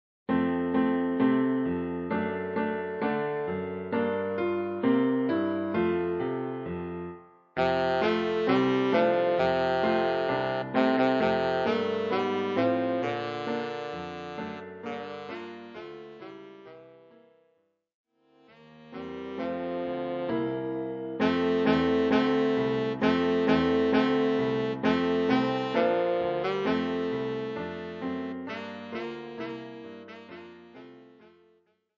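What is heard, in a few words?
tenor saxophone; Jingle Bells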